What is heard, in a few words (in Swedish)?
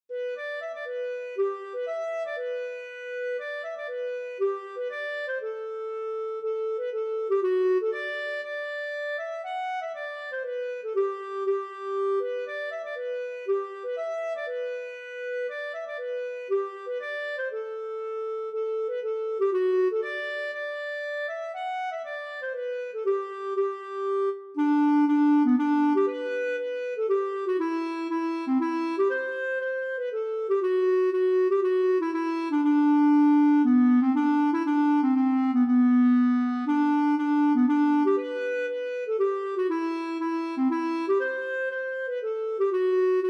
Orbyhuspalska